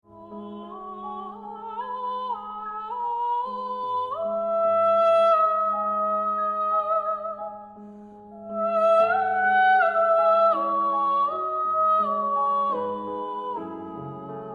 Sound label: Vocalise